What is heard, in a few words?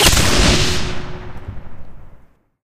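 explosion generic medium; Source Engine; Portal 2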